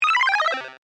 Video Game Descend, Technology, Video, Game, Descend, Die, Death, Game, Over; BlastWave